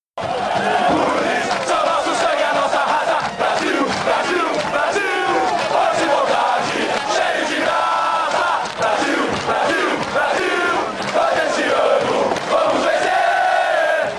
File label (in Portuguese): hino cantado pela torcida